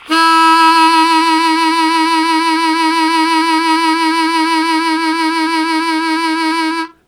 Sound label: Strong Vib Sustain E1, Harmonica; Zero G